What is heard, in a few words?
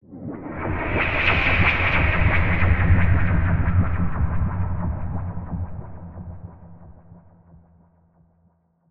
Swept Noise